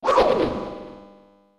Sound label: Super Sword; Link; Super Smash Bros